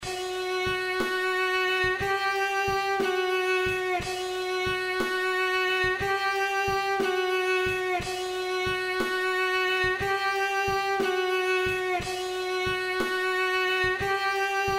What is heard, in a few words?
drums and vio